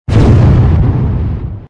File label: bomb; bomberman